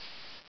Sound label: walk